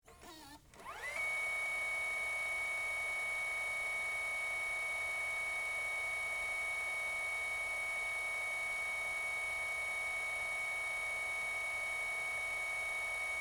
HD Camera Rewind, Hd, Camera, Rewind, Cassette, Tape, Sony, Hvr-Z5; Technology; BlastWave